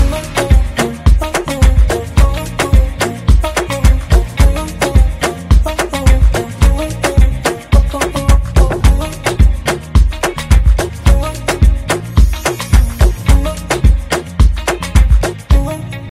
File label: hindi dj mix ringtone; bollywood ringtones